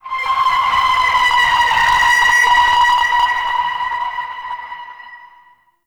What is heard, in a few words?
Tonal Nightmare; Ambience; Warframe; Shadowgrapher